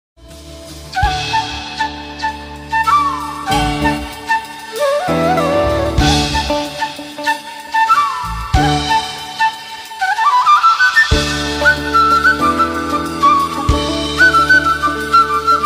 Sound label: song bgm by flute